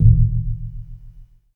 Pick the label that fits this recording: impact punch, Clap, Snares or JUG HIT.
JUG HIT